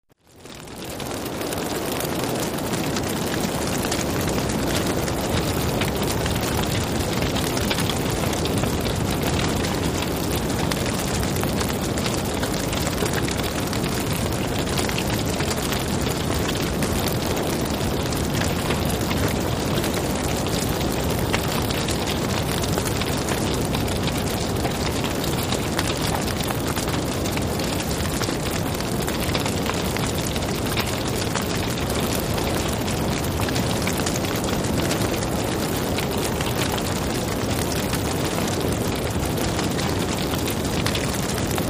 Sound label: Exterior Fire, small